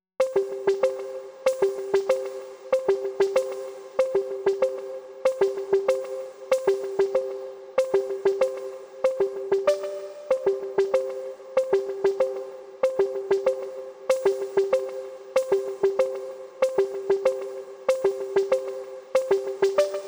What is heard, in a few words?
Poppin Piano